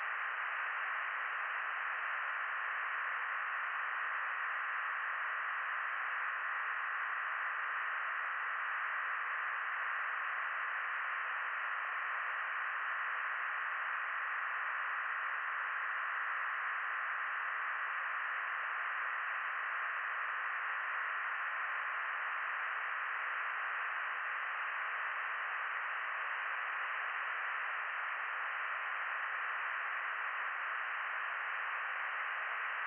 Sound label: from radio, 7177KHz